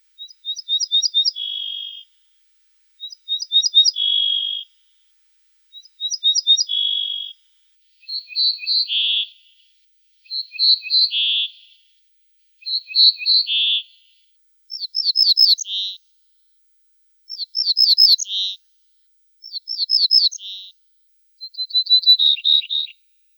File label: Emberiza hortulana